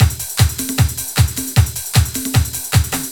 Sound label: LOOP, Eurotech; Sounds Good